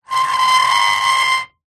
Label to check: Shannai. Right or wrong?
Wrong